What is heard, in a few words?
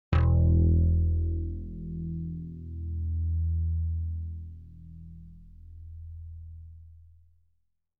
bass slap e1; Sound Sampling Collection VOL-5; Drums & Bass Fusion Funk Effect